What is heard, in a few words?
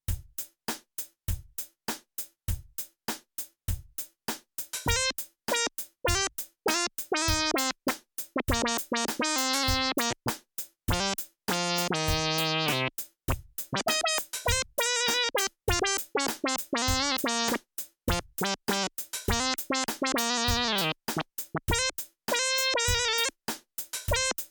Lead